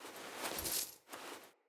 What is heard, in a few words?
Coin Toss Foley; Level Events; Midrath